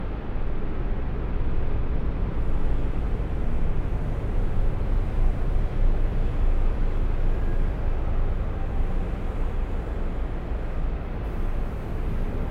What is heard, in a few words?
Ambient